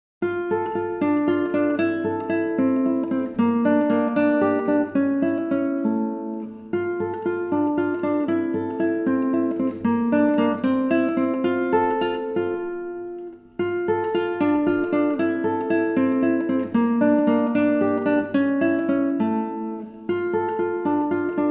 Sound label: Jig